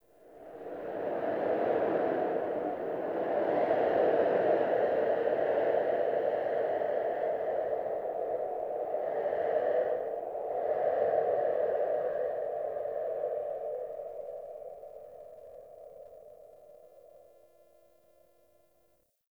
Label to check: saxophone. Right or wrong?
Wrong